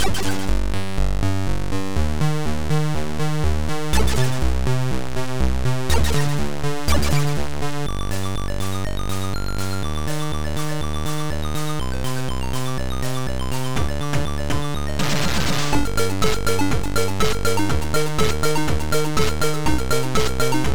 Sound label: Maximum trance